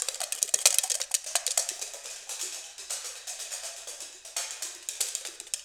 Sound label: SHELL, Shell Dance; Roland LCDP14 Africa VOL-2